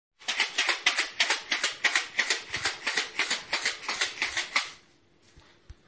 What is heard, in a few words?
Baby Maracas